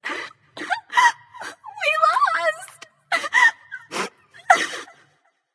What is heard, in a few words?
boohoo light; smite; voicepack; hel